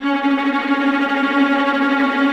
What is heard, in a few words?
VA TRM F3C L, Orchestra TREM; AKAI Advance Orchestra Upgrade 97 Vol.1